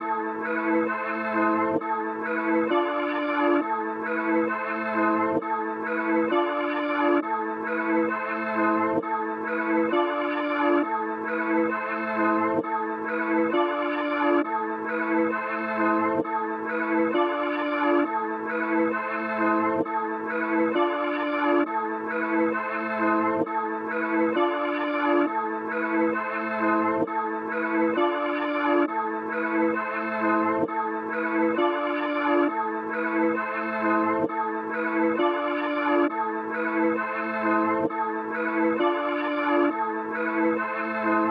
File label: 133 BPM A Min Reversed, Drill; Cymatics; 2022 Melody Collection (BETA); Smoke